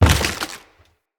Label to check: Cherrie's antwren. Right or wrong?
Wrong